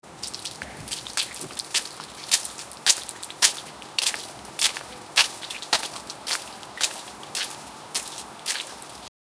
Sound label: the sound of footsteps in, mud